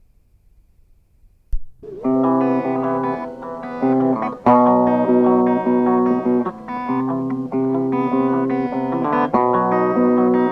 summer guitar

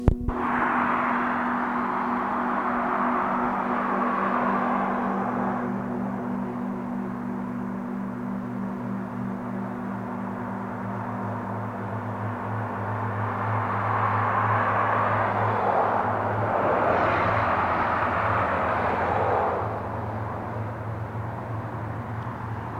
calming waves

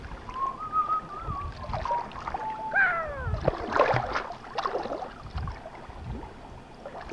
creek

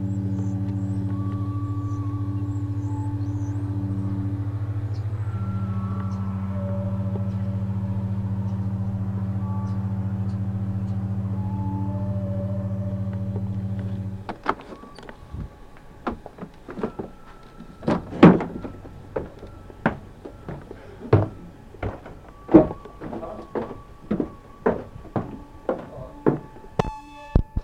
stair bird

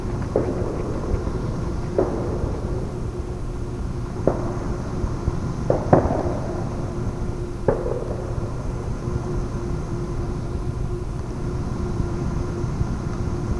gunfire

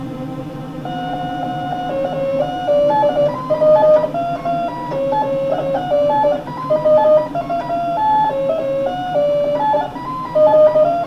transistor organ; little jazzy thing on M's cool transistor organ

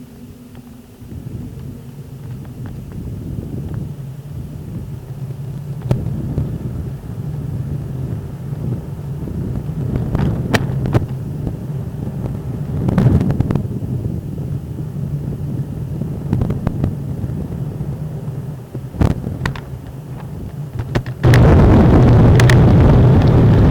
darkly windly

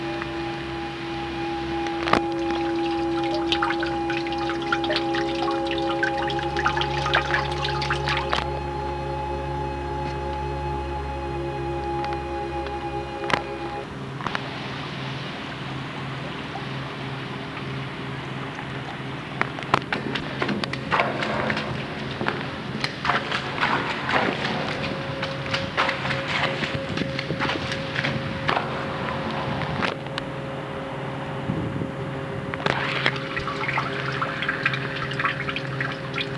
water stuff

